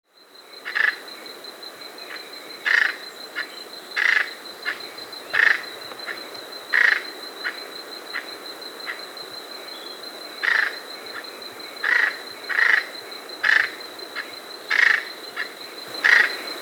Blue-Banded Toucanet (Aulacorhynchus Coeruleicinctis), Andean Birds; S. America